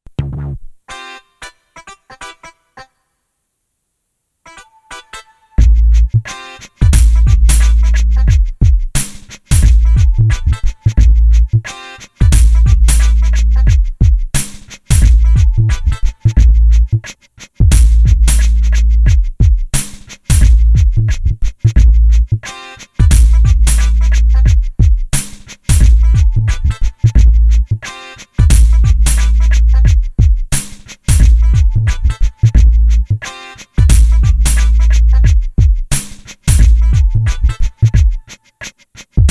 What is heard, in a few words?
Urban Funk